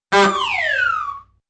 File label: honk and descending whistle